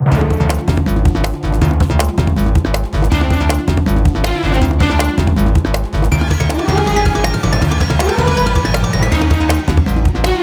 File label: music forest chase bpm160; Donkey Kong Country Returns HD